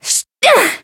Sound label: Voice attack; nekoko